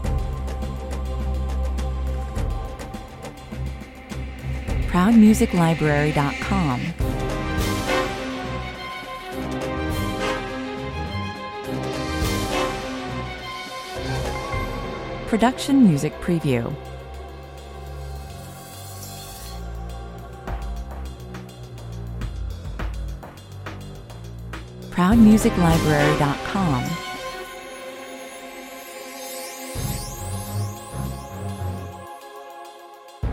adventure trek 104 bpm

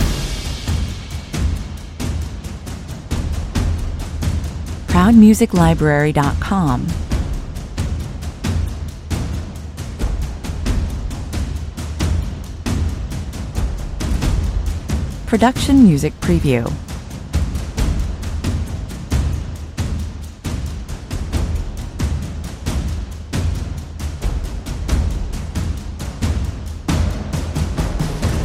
glory percussion loop

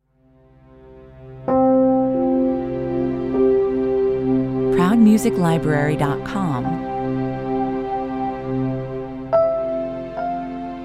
fireplaces 119 bpm

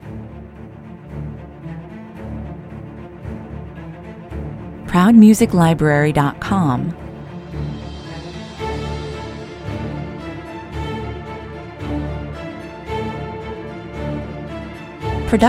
no percussion intro

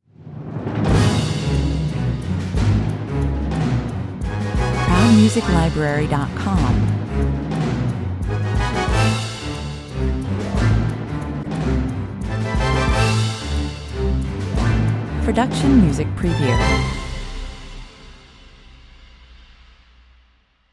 secret agent spy chase

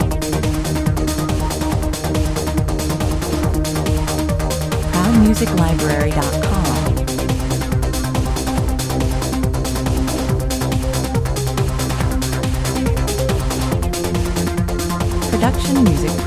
trancetrain 140 bpm

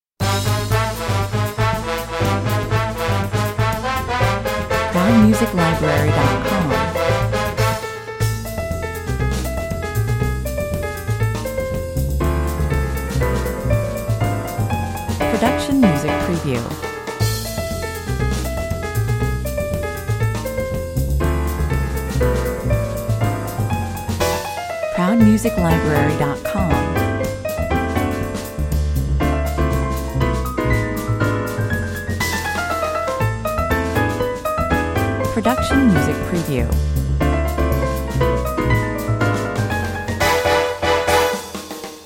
samba 240 bpm

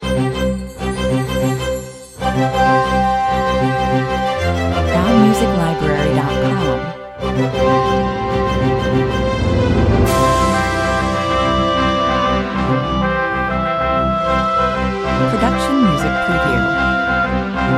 90s orchestra fanfare 97 bpm

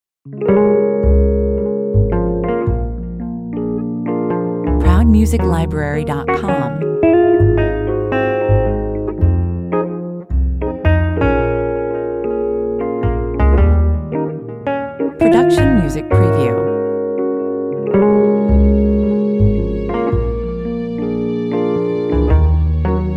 swing, 110 bpm

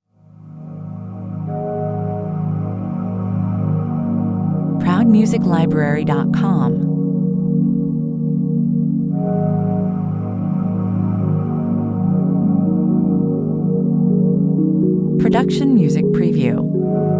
rainforest 125 bpm

